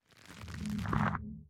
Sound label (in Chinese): 紫颂花生长声